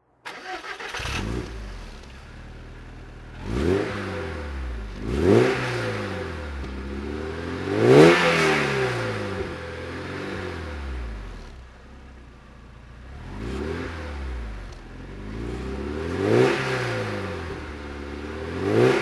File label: porsche 968cs throttle